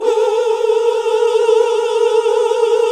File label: Gosp. Oooh; Sound & Vision - Gigapack II CD 1 (Roland); HAL Gosp. Oooh 2